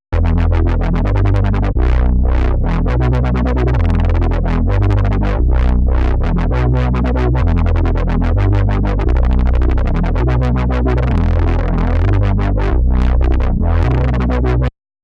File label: wub